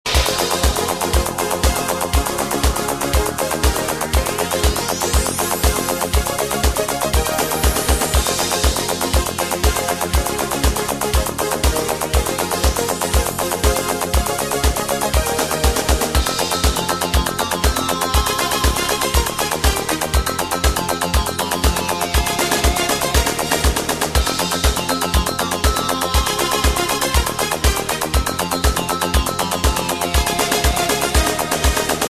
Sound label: Koto